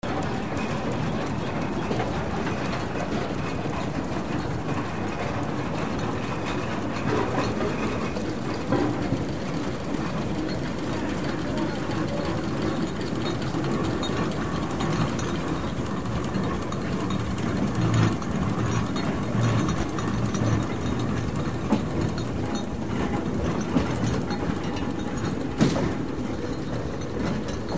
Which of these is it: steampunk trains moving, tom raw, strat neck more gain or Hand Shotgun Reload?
steampunk trains moving